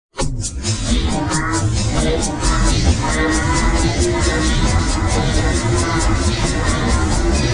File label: glockenspiel